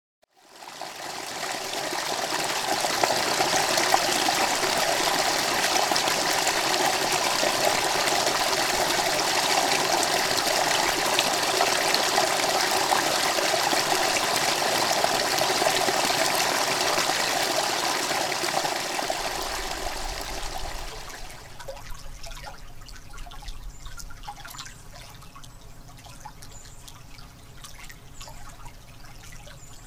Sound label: water woodfrogs peepers mix